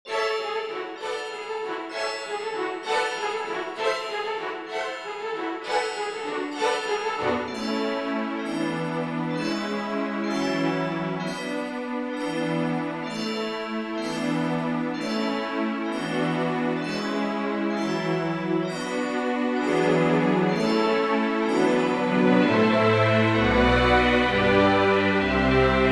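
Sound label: Ding Dong Carol, Full Orchestra Accompaniments; Christmas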